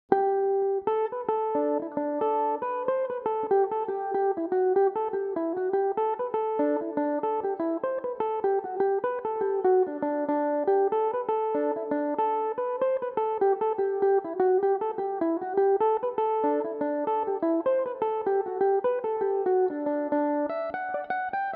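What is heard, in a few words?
D mixolydian, irish mandolin, tunes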